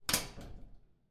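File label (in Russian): звук закрывающейся двери подвала из тонкого металла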